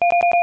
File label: 4 bells; Goldwave